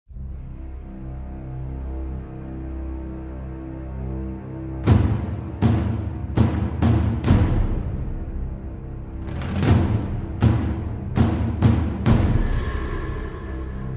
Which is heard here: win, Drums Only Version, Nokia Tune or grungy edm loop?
Drums Only Version